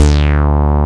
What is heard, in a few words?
SYN STBAS; Sound & Vision - Gigapack I CD 2; JD 800/990